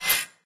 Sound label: editor panel raise, ui; Source Engine; Portal 2